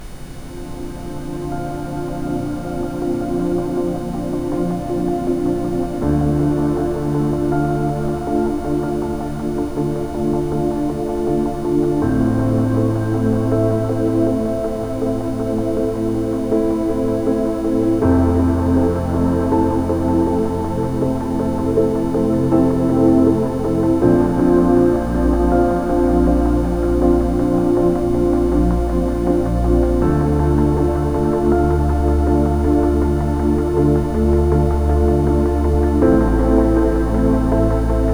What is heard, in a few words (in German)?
Rife Emotions Ozean mit Ambient Sound; rife frequenzen